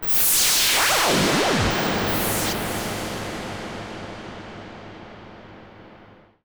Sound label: Virus Noise